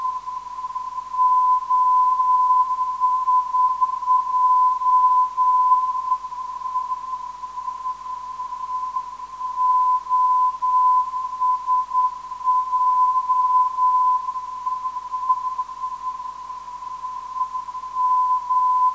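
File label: 420kHz, non-directional beacon; OSJ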